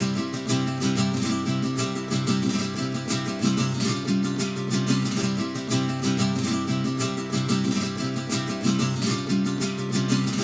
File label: Guitars, 092 Cmin